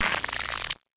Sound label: mechanism tiny bot layer; soundmorph